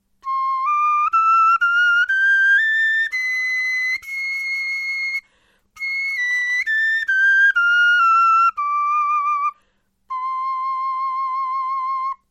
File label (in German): tonleiter, knochenfloeten